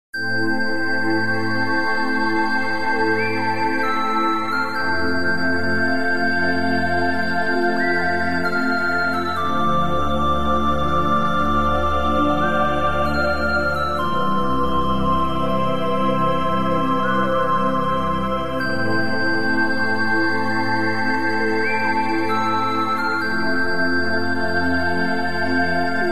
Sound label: Mobile Ringtone